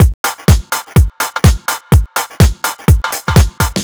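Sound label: Electro Haus percussion, Drum Loops 125bpm; Nu Dance Electro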